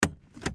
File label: Auto Parking Break Off